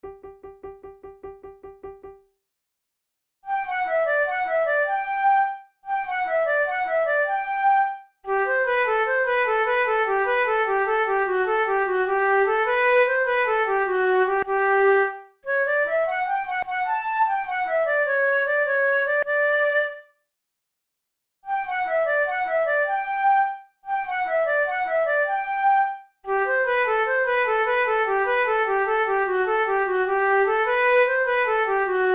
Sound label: vivace, flute tunes; loeillet de gant op5 no2 v